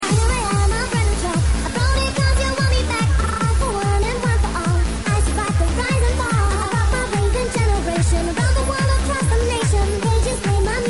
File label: club ringtones